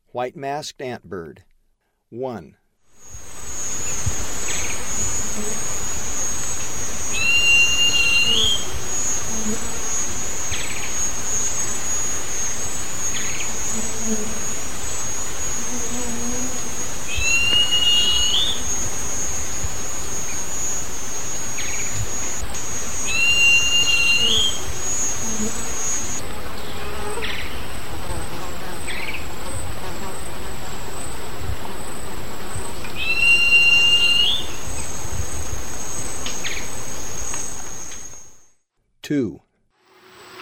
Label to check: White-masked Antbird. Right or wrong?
Right